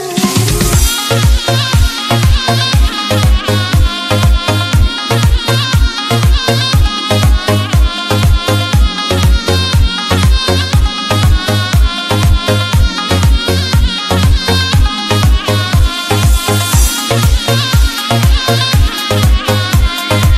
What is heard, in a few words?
dj arabic ringtone